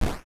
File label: Character Shoot Hit